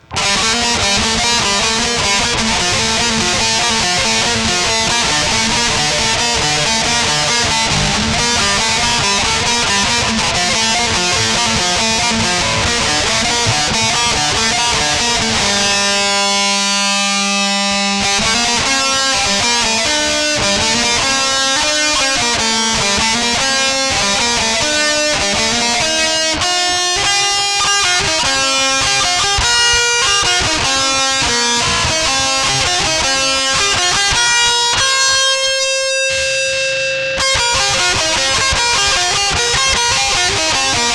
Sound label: Bridge guitar